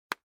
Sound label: Cell Phone Hardware Touch